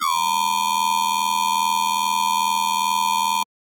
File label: Riddim Bass Hit High Layer; One-Shots; Bass Hits; Ghosthack; Riddim Essentials Volume 2